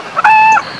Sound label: heron sounds